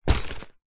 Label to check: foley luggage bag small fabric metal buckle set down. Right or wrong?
Right